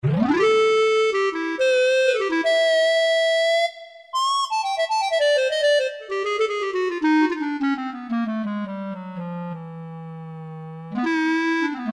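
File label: Clarinet, Yamaha TG33; Factory Presets; WN-Clart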